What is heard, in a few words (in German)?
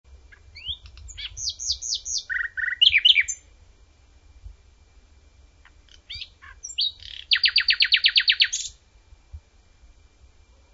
Nachtigall